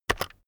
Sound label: keyboard external enter press very fast heavy